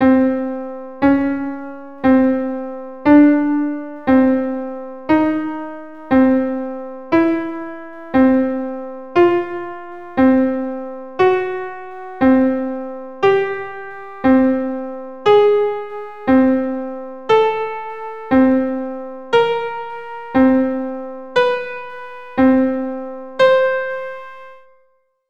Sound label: Intervals, keyboard